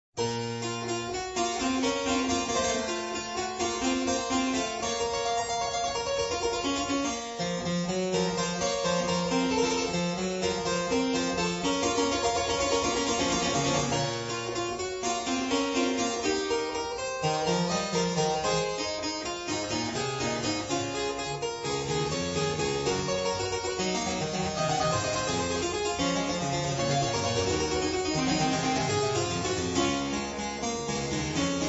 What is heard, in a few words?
Sinfonia No. 15 in B Minor BWV 801